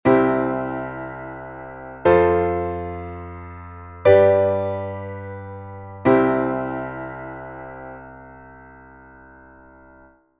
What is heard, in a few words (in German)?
akkordfolge c f g c; wie schreibe ich einen song